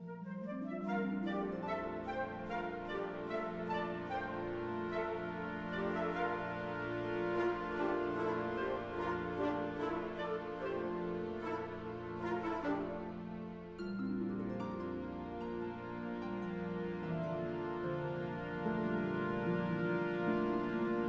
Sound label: Music Short Punctuations Defeatist 50bpm Loop; asterix obelix slap them all 2